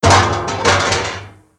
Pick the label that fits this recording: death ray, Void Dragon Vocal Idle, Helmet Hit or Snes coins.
Helmet Hit